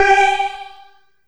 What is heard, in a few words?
Synth Hit G